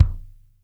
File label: LOW HIT R; Sampleheads - New York City Drumworks VOL-1; SW KITS